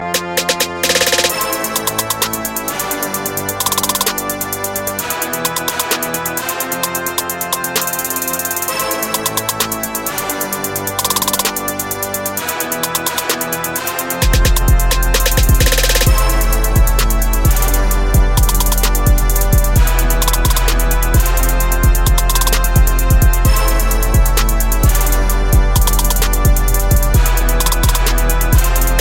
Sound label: Hip Hop